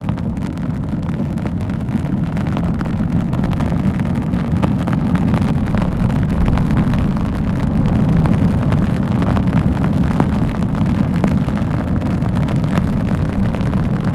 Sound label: Cave Torch Fire